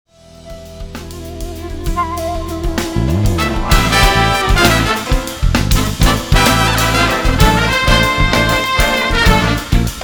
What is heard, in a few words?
Brass Reed